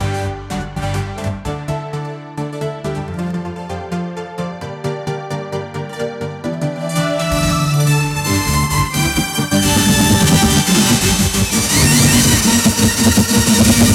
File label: flash fast anime